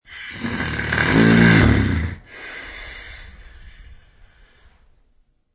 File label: Snore, Human snore; Human snore variation 4; Snoring